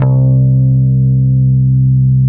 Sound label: bass, Drum & Bass 160 BPM; Ueberschall; Drum & Bass Flash Trackz